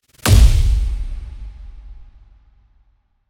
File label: Trailer Hit